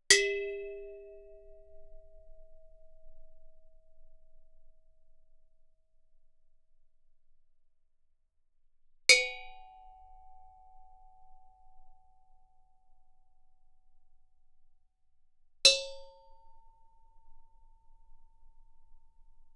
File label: Gamelan; Propeller Island - Complete Gamelan